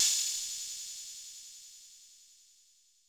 ride, Roland MC-909

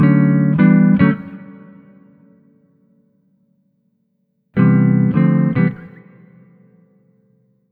Multi Guitar Swing Gtr-D, 105; Zero-G Kocktail Kollection; Construction Kitz (Lounge)

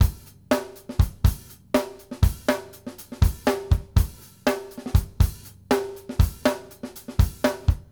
basic B, drumworks

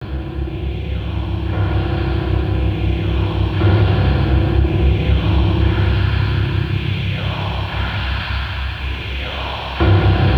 Ambient FX, Ambience FX

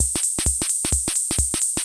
CR78; Best Service; XX Large Most Wanted; Sample CDs

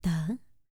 TABLA BOLS, E.INDIAN; ILIO; Vocal Planet

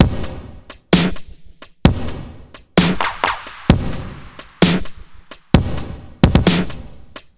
130 Beat Leisure; Zero-G; Future Wave; Beats

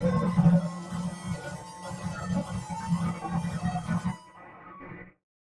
Malfunction Synth, malfunctioning synth samples

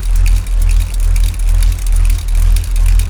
BULLROARER, Primitive Instruments

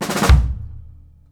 KICK SNAR, Live Snares; PlatinumPhattCD1; E-MU Producer Series Vol. 8 – Platinum Phatt

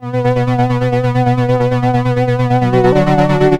2 Step 133 SYN, House

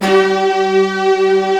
C4, YAMAHA, Analog; USB Soundscan vol.49 - Analog Fantasies; AKAI

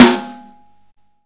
Snare Live, Drum and Bass

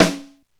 snare, AKAI XE-8; Greytsounds

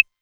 808 Clave; Novation Drumstation

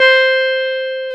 Jazz Guitar; Club-50 - Foundations; Jaz-Kessel

